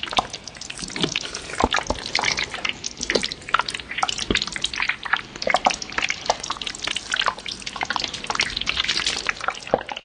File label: slime bubbling